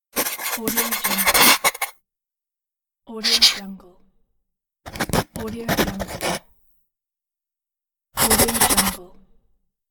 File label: document signing; productionelements